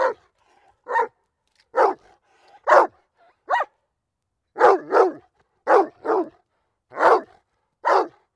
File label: dog Barking